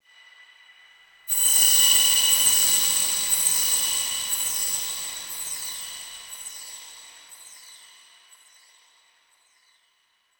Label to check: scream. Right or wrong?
Wrong